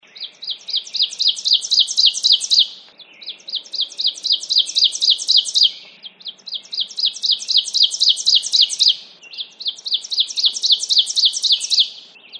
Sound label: oven bird